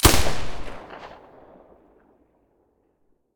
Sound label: silencer galil stereo, weapons